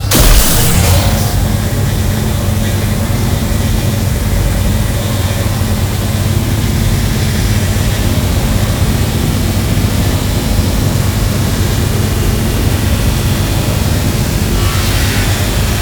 beam attack start; The Monster Plane; cameraman ranged

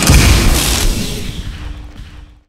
Shot; skibidi toilet glitch; Cameraman RANGED

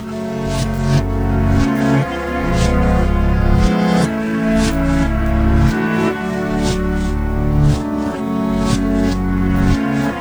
chase; drg huggy wuggy snpc; slender; corruptedwoody2

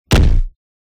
ground punch clown; zoochosis; lazynap pack 2

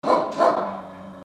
pug jumpscare; copycats; Panda Clones Jumpscares

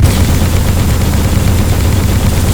beam; camerman titan minigun

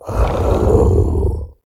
pain, procoptodon; zoochosis pack

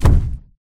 footsteps; huggy nap

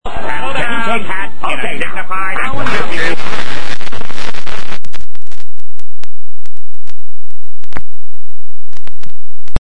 jump, squid; huggy wuggy snpc

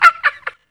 hunter pain short; huggy wuggy snpc; elmo

